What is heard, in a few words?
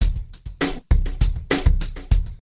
brush muff beat